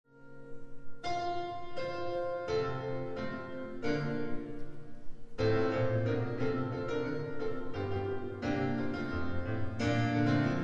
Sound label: Gospel Voices live